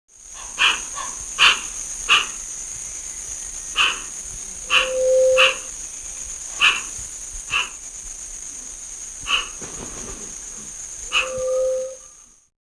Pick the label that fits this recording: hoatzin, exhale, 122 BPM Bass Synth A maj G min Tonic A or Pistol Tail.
hoatzin